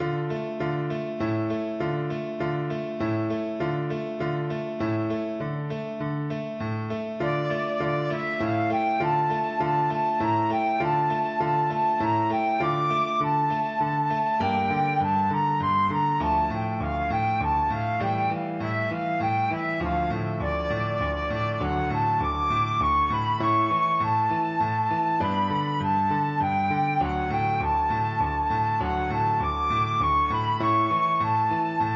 flute

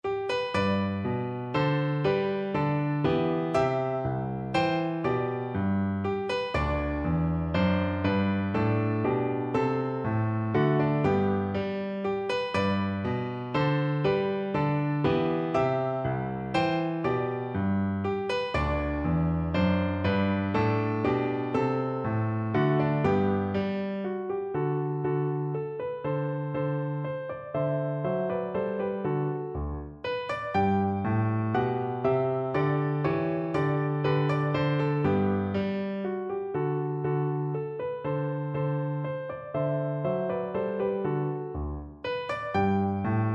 German Dance, piano; K605

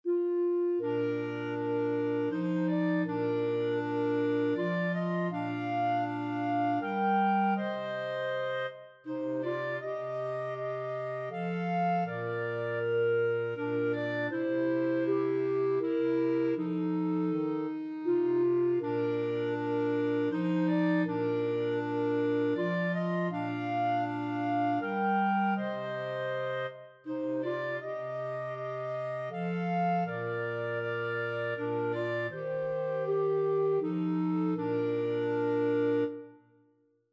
away in a manger, clarinet trio

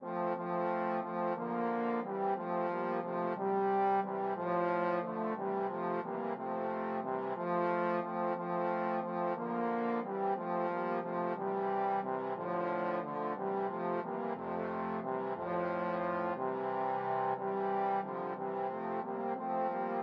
trombone quartet

